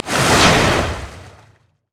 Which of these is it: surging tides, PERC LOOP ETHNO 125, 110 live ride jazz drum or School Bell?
surging tides